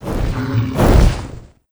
king peregrine main, monster

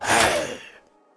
death knight damage, monster

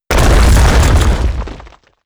big stone deth, monster

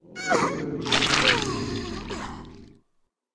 hexscyther death, monster

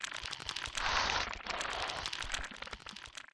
crag crab idle, monster

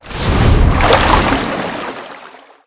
water bead atk1, monster

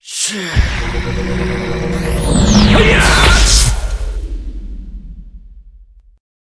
silverwood attack, monster

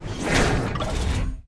damage, monster

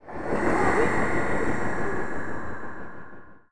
ghost gate death, monster

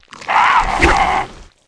antra lug attack, monster

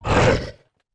frog; frogmk2

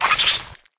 antra lug damage, monster

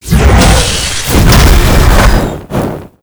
attack, monster; patren

